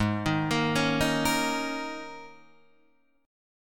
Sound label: Ab Suspended 2nd Suspended 4th Ab down Guitar Open D